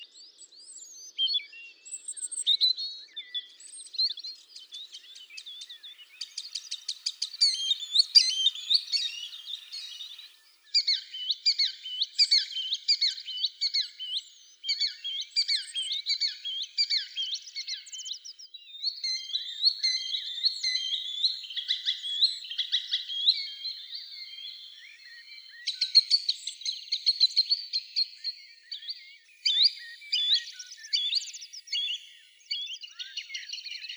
chernish

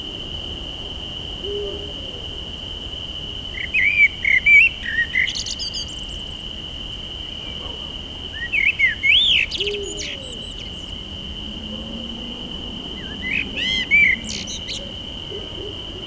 chernii drozd poet v nochi

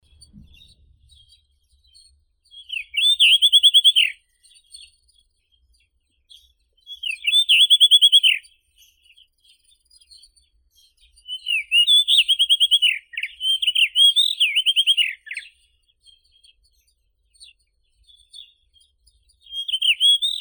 drozd sinii kamennii monticola solitarius

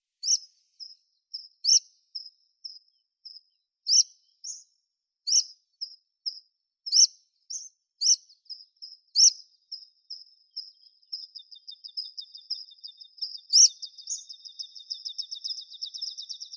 konek gornii anthus spinoletta

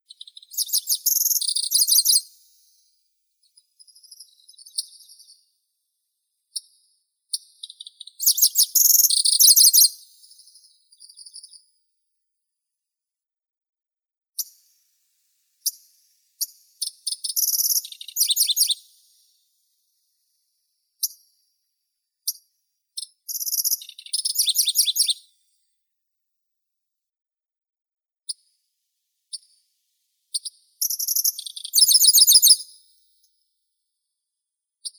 sverchok pevchii locustella certhiola, zvuki prirodi

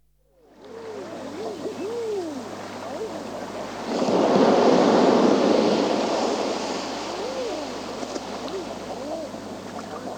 na skalistom morskom beregu

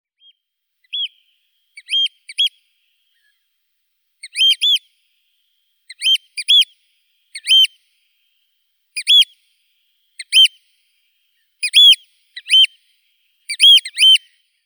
kulik soroka haematopus ostralegus

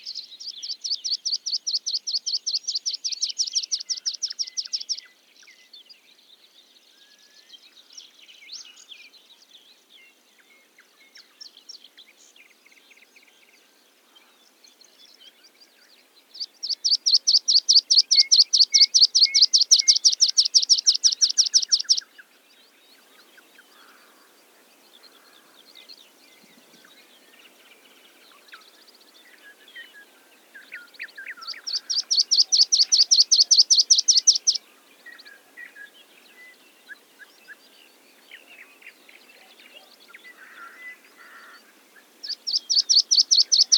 pesenka lugovogo konka

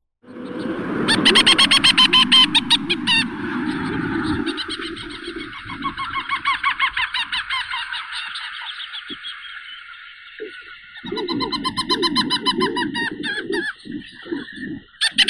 zvuki prirodi taifunnik pestrii pterodroma inexpectata

